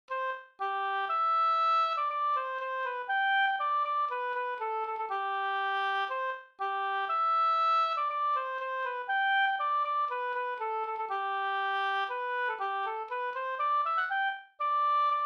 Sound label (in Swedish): polska Gokpolska Taxinge